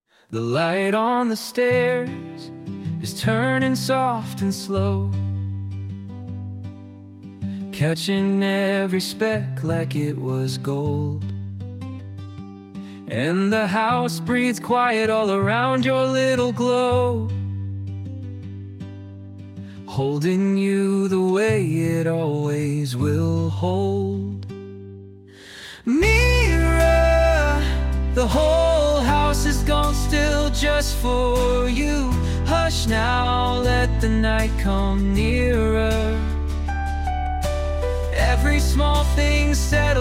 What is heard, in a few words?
lullaby, name songs; mira